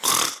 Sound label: Big Fly Snore Startle; gamerip